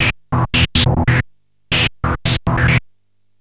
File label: Treat Guit, Industrial Nasty